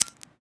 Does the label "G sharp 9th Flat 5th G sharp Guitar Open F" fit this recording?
No